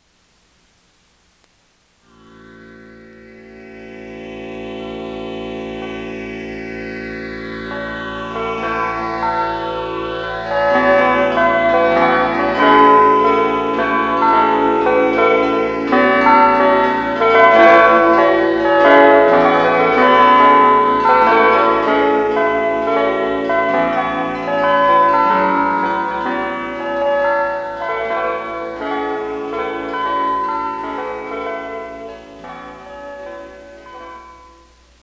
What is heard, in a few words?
class start chime; 授業開始時チャイム